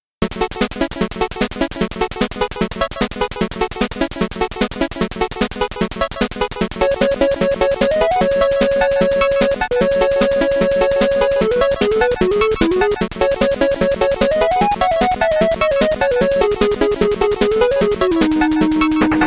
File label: Dungeon; Ninja JaJaMaru Collection Original Soundtrack